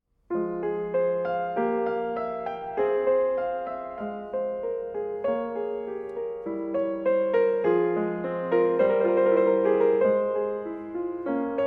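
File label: Prelude & Fugue in F-sharp minor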